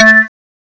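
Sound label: piano synth, ANALOG; CMI Sound Library Rev 1.3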